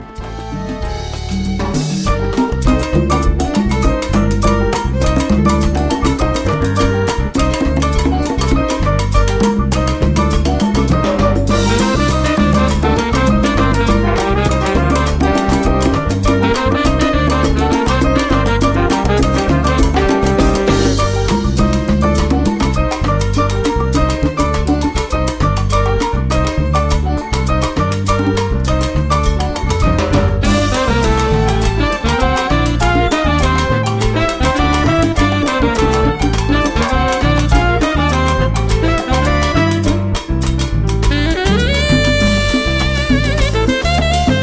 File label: Montuno section; Wood Place Ubiquity Music